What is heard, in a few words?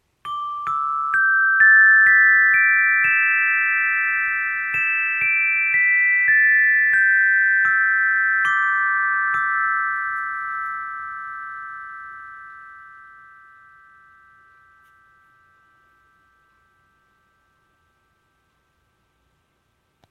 nap time tune; roots and wings daycare